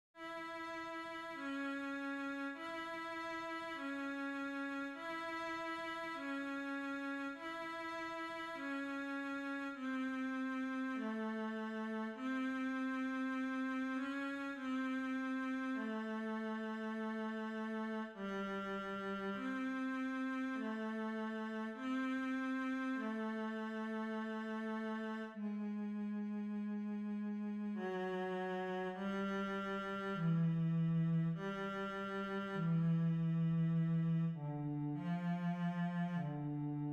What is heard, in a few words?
Cello